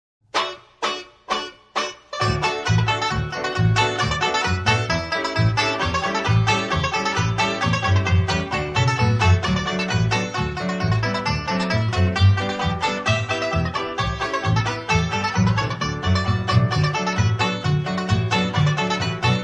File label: chinese breakdown banjo extended; square dance music